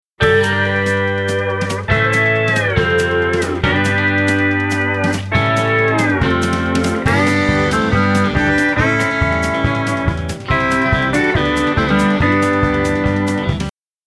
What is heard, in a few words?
Madison Shuffle Fast Twang Tang